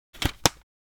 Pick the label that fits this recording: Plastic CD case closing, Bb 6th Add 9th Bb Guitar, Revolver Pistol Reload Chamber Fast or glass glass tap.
Plastic CD case closing